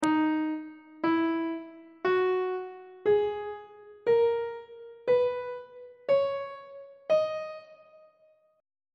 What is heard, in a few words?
d sharp phrygian mode; on treble clef